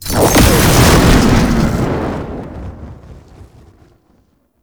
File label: Dash Start; Roathe; Warframe; Tau; Abilities